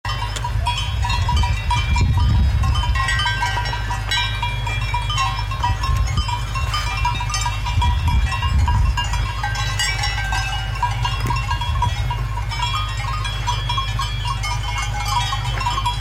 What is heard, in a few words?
Dolomites Cow bells